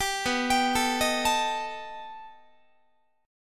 B Augmented 7th B down Guitar; B+7; arpeggiated; 22,19,22,19,19,20; 4A,4E,5A,5D,5G#,6C#

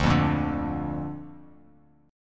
D Minor Sharp 5th D down, Bass Standard; Dm#5 strummed; JGuitar

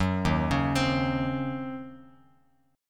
D sharp Minor 13th D sharp Bass Standard, chord; arpeggiated; JGuitar

